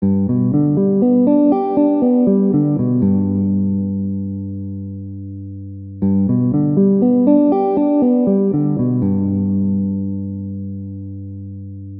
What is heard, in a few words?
triad